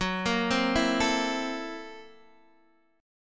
F sharp 7th Sharp 9th Flat 5th, Guitar, Open D

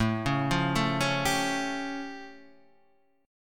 A Augmented 7th A; A+7 Chord {5 4 3 2 2 3} arpeggiated

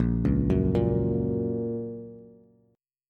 A sharp Minor Sharp 5th, down, Bass

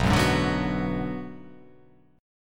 B Minor 6th B

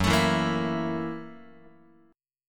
F sharp 7th Sharp 9th, Guitar; strummed; JGuitar

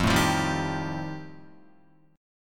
F 9th F Guitar, chord; strummed

